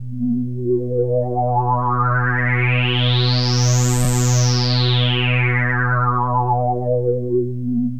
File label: TRANCE